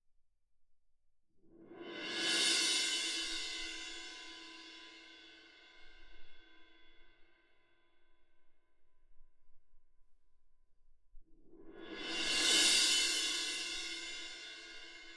Perc

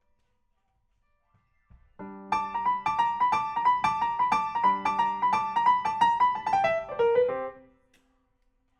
PIANO, RNR for piano

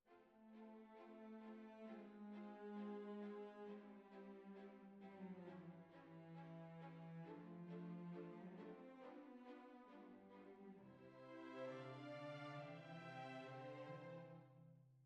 Flute

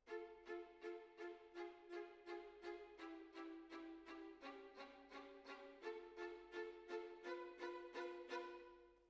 Decca, Jazz for piano

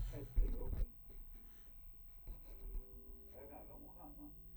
C Bass DI

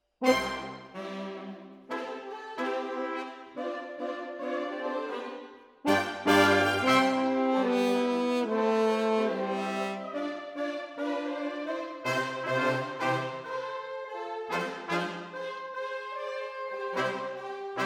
Horns Over

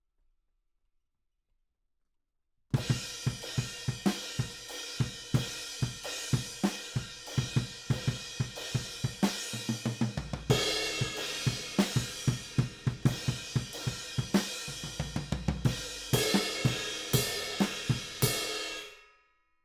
Ride

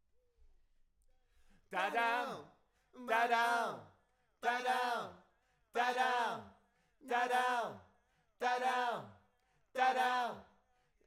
Voc

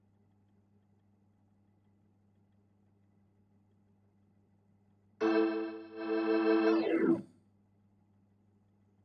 Hammond

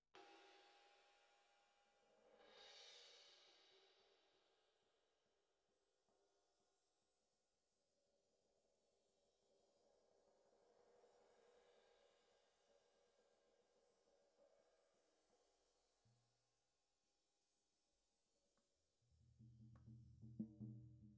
Snare Top

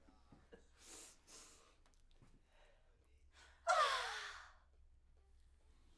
Backs; SCHOEPS

